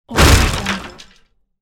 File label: breaking down door